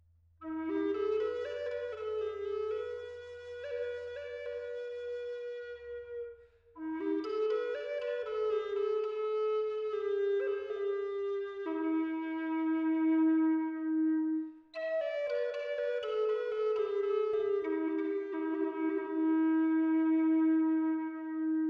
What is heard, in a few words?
Eastern Red Cedar E 432 Hz